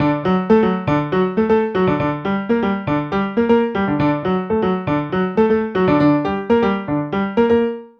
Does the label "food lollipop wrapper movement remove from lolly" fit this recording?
No